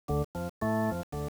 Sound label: Print, earcon